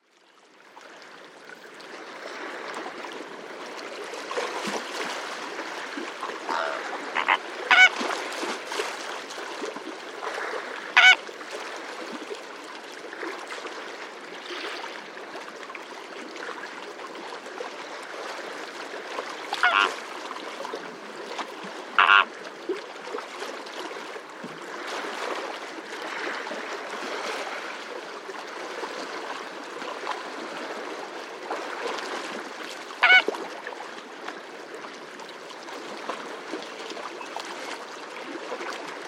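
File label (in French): Etang Vaccares ressac flamants échasses grande aigrette grillons des marais grenou; grenouilles vert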